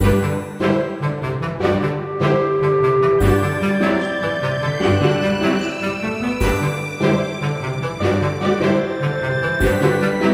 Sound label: post battle music; EvoCreo; Android game music